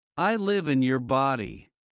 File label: Heart low; Classroom humanbody